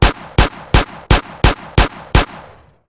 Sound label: pistol shoot